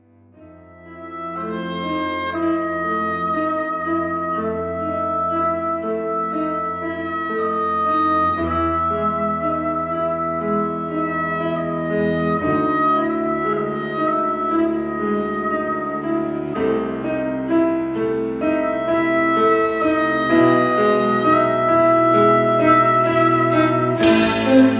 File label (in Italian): armonica